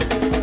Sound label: conga timbale latin, drum loops and breaks